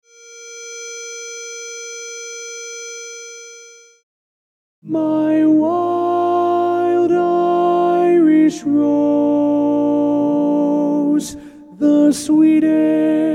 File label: Tenor